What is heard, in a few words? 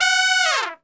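F#3 trumpet, Brass Super Section; Best Service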